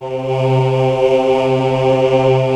CHOIR; B CHOIR

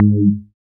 BAS FM DX-7

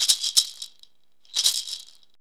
maracas; DRUMS & PERC

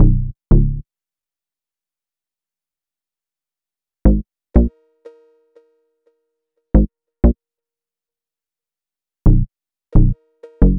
Bass, Hip-Hop

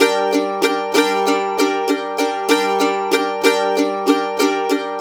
Blues Guitar Samples, RIFF; ILIO; Fretworks; 90's Sample CDs

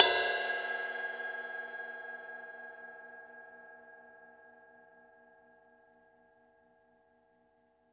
RIDE, Drums Percussion Kit1 Room Mic

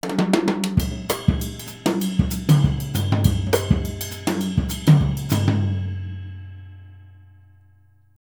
Jazzistic; Univers Sons

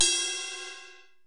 ride, Kurzweil K2000

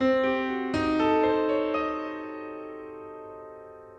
Piano 120-C, horrorscape samples

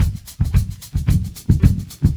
LOOP, 70's Breakbeats; USB Soundscan vol.46; AKAI

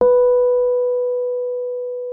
B4, RHODES; Xsample VOL-1

